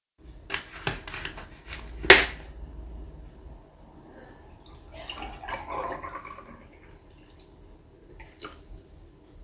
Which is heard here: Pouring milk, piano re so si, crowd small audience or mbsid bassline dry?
Pouring milk